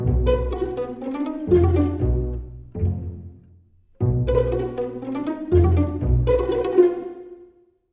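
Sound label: jungle world music, joyeux baston pizz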